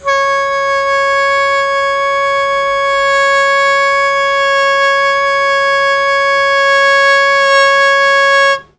Long Sustain Aa C#2, Harmonica; Zero G - Harmonica

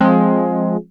wurlitzer, dx7

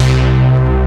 PROPEL-BASS; Rubber Chicken Software Sample Bank

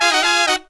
Pop & Funk Brass; Best Service ProSamples vol.25; AKAI

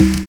ZULU CLAP; Univers Sons - Kitch 70s

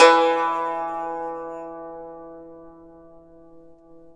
YANGCHIN, E2; INIS - Opium

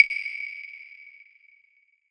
Echo Clave, processed; Sonic Foundry (Sony Creative Software)

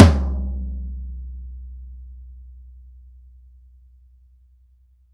TOM E, Drum Dry; East Collexion; Drum 1

